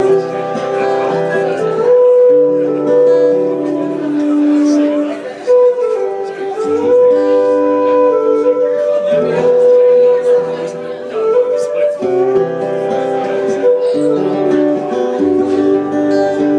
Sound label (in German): mit grosser Tinwhistle